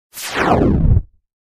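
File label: Descend Laser Distortion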